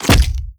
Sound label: shut, zombie blind, jaws; Half Life Alyx; creatures